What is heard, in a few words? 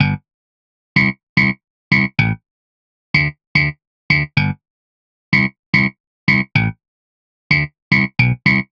bass